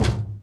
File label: footstep walk hollow metal